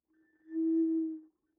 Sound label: Bottle Blown